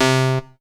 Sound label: DX SIMPLE C3; Q Up Arts; Dream Experience